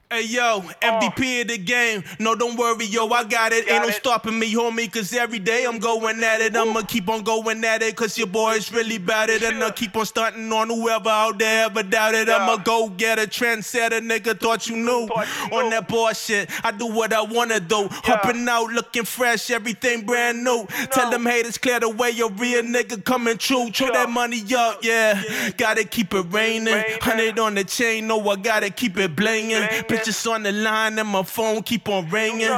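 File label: hip hop 167 A minor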